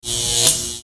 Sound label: Lazy Battery